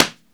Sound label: DESK, HANDY PERCUS